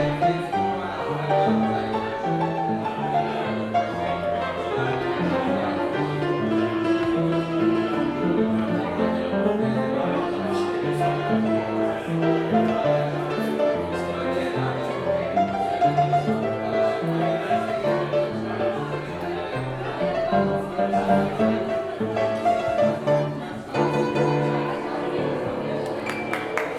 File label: piano improvisation